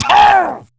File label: Pain, Battle Barks; Warframe; Grineer Ghoul Sawman